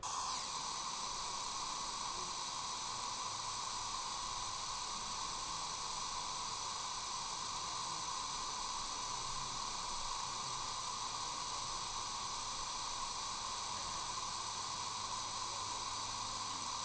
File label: Signal Noise